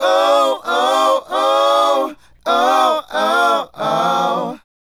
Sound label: Male Group, Hip-Hop and RnB Vocals